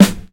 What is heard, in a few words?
boom steel snare drum sound g key